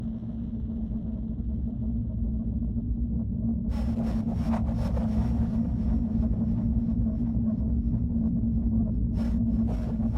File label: darkness, ambience